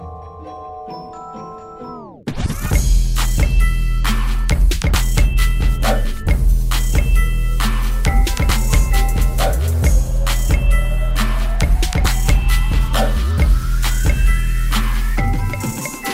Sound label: Sugar Plum with Bass